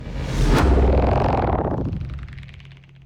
Attack; neptune

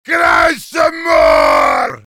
yell; heavy

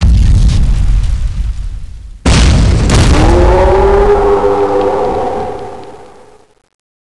titan appear; the monster plane v2; kolmods spawn

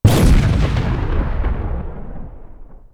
explosion petrol small, medium bomb; gbombs 5

